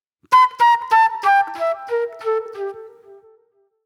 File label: Sharp Delay Flute